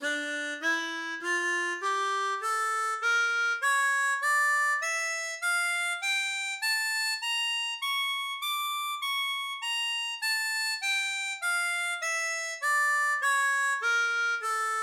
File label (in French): Re Mineur, Gammes, harmonica